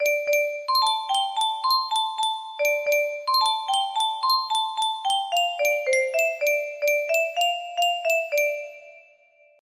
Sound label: musicbox